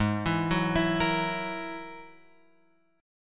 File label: G sharp 7th Suspended 2nd Sharp 5th; G#7sus2#5 Chord {4 7 4 X 5 6} arpeggiated